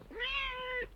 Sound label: Cat meow